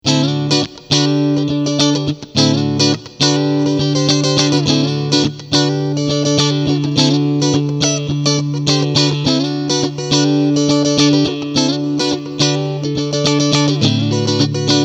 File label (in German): Front und Middle Pickup Cle